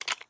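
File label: inspect movement; Valorant; cstrike; ghost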